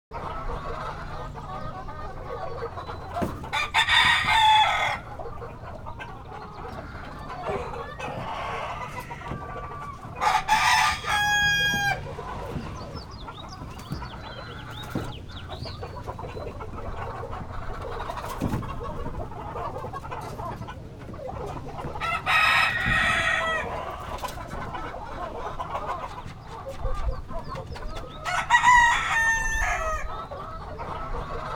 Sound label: Village rooster crowing distant perspective